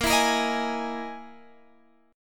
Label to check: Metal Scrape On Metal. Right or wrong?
Wrong